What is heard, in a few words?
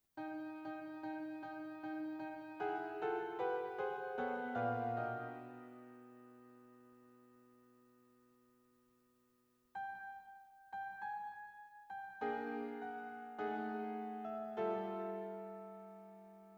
Atonally Fantastique